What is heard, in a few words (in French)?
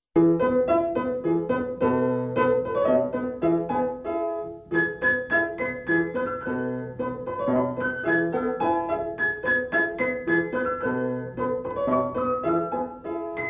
Gouttelette de pluie Playback